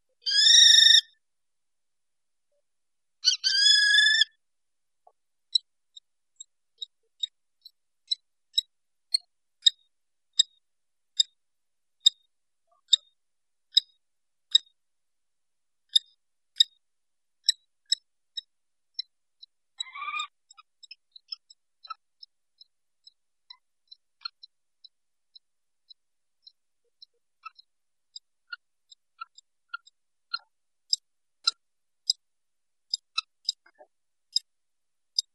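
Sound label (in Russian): obyknovennaya pustelga golos, common kestrel voice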